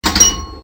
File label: slingshot